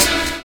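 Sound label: SAX N GUITAR; Univers Sons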